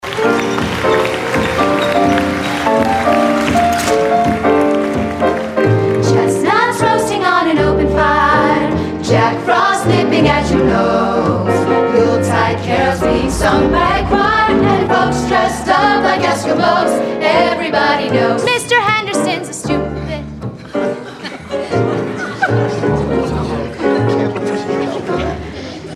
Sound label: kids chorus